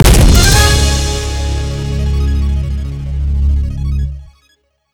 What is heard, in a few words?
exciter great shot